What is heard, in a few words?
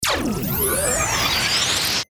Power Up Laser Ascend, Power Up Stinger Digital Tuner; Production Element Imaging Accent Transition; Rise And Dive